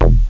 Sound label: minimoog thud; OctaMED SoundStudio V1; bass